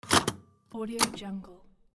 insert cassette tape

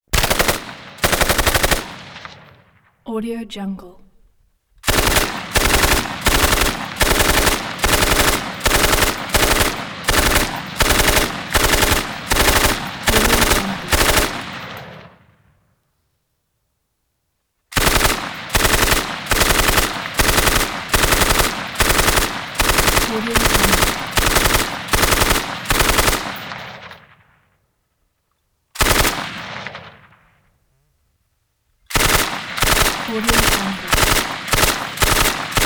machine gun bursts; prosoundfx

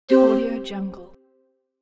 euphoric ooh confirmation sfx

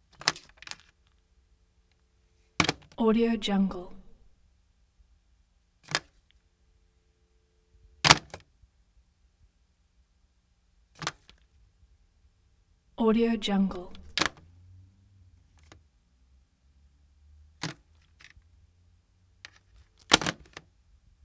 telephone effects

